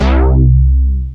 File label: EII BASS, EMU; Rubber Chicken Software Sample Bank